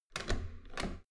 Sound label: metal door heavy handle use